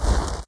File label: Snow step